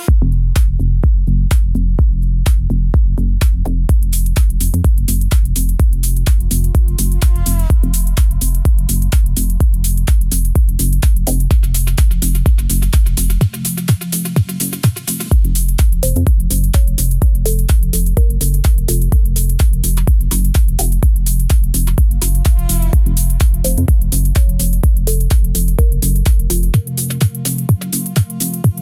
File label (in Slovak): minimal techno vzorka; hudobne afirmacie cd hojnost